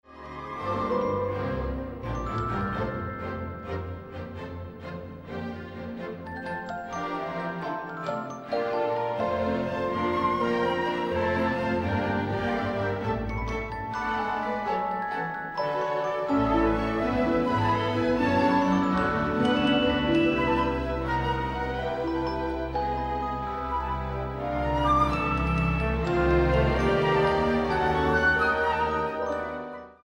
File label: celeste concertino